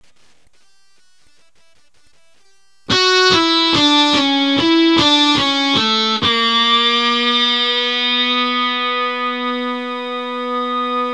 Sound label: e gTR